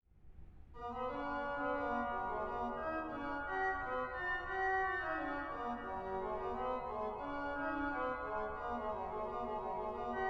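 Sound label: Trio, organ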